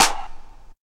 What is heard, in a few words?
clap g sharp key, Loudest frequency: 3187Hz; single hits one shots